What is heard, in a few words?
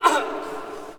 Hockey Male Hurt; SFX Kit; Hockey Vocal Sounds